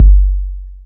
Kick 808; maschine

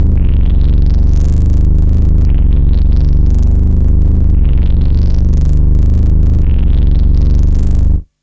NOISE FX

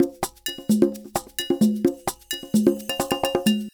Loop Percussion; drums pads

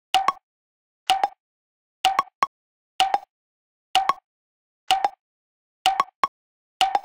KIT PERCUSSIONS

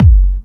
kick; Zephat

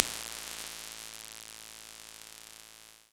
grain noise

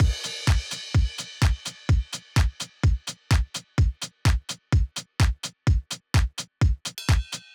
beat

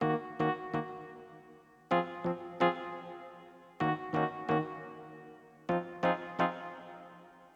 lead

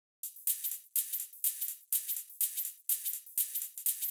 Drum loop

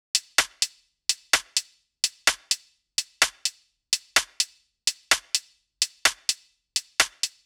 beat

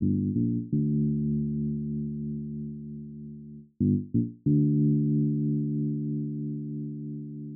bass

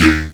Percussion

